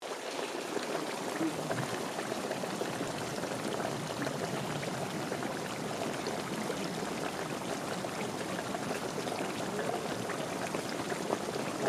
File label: Sounds of Unzen Jigoku Onsen